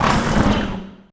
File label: CYBER LIFT, CYBER TOYS; Spectrasonic Distorted Reality 1